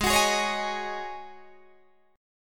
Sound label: G sharp 7th Suspended 4th G sharp, Guitar DADGAD